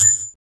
RSS PERC

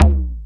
JD800 Internal Drums, Roland JD800

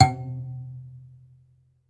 BASS KALIM, Kalimbas

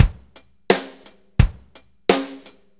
4-4 Loop, Drum Loops, Full Kit Loops; Drum Tools

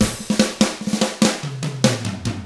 rock 6/8, drums; ILIO; Double Platinum Drums 1

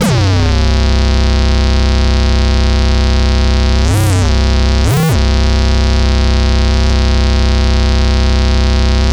electric, special FX twisted; USB Soundscan; AKAI

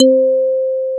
DS8 C 4, Korg; Back In Time Records; Korg Universe VOL-1

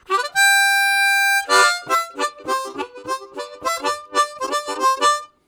Jukords, Harmonica; Zero G

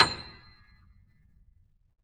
B 6, Keyboards Acoustic, GRANDP ST; USB Soundscan vol.17

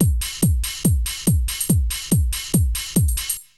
Essential beats; FM 57 - The Ultimate Sample Collection (1997); Repedative Nature(142), Psycho(140), Crowded(140)

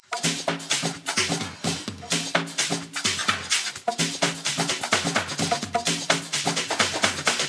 Loops 128BPM, Drumloops; Vengeance - Essential Clubsounds Vol.5

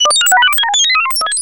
sci fi; Masterbits - Switch FX

